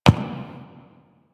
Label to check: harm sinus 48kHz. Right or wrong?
Wrong